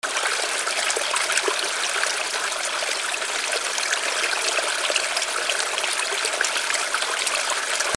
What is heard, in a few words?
WATER